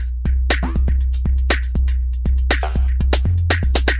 lotsa bottom nokick, drum loops and breaks, 120 bpm